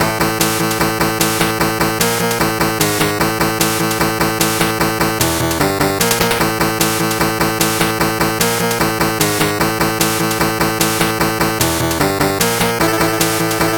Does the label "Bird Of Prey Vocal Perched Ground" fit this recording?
No